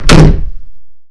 door slam; ambience

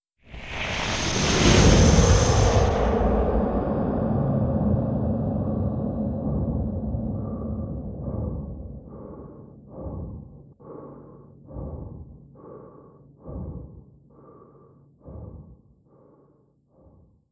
musical

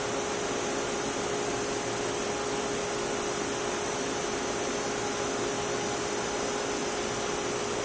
computer servers

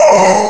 human bleed

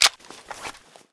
mp5k mag out

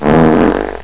fart; phat

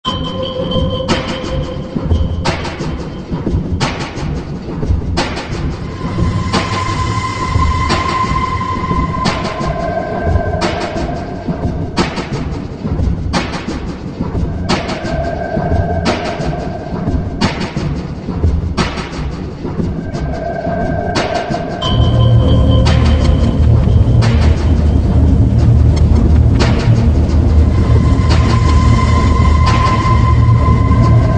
Industrial Horror Beat

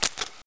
show hint

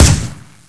ump45 shot, weapons

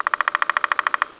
bugs

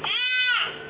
deer

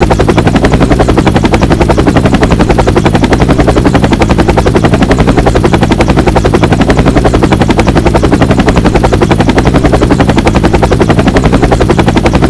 heli loop

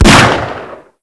shot; scmod

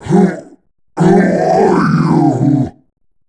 death; omegashenron

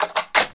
bolt; hl2betasniper

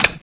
firemode switch, weapons

